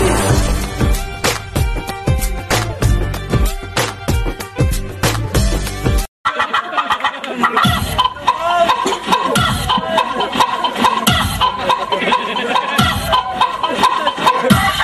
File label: funny love ringtone